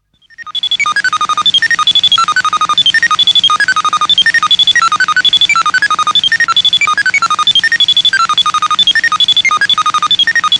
Explorer 12 Satellite, telemetry effects from satellite; Communication Sound Effects; BBC